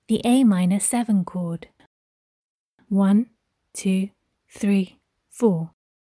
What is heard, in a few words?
a min7 chord